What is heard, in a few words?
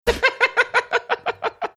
giggling